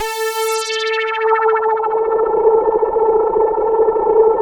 JELLO, KORG DW8000, Vintage Keyboards; Big Fish Audio - First Call Vintage Keyboards

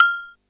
cowbell, Yamaha RY-8

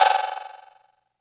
Percs, Elektron MachineDrum SPS1 MKII

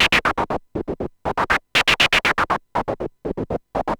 Gated Seq Noise, 120BPM

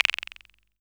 Quijada, KorgMP7 drums

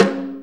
Snare, Snare Drum; Drum Tools

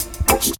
Shank, Special FX; Zero-G Jungle Warfare 1, 2 & 3

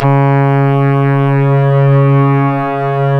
C#, strings; Big Fish Audio

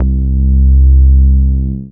BAS MINI, Mini-Moog

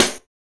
SD, Dance Mega Drums; Best Service; SD-HIP

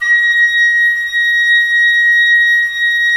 Flute, Roland LA Composer

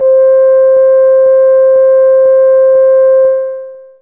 Melo Horn C4, Fairlight CMI IIe Wind

